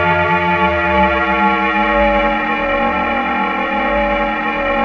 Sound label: ring mod; Zero-G Ambient 1